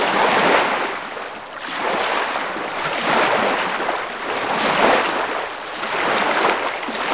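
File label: water wave; envrn; The Elder Scrolls III Morrowind GOTY; XBOX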